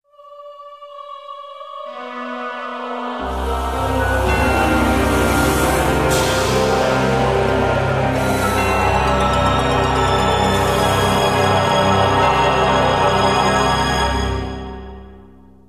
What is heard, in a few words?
Gameover; Castlevania Harmony of Despair; PS3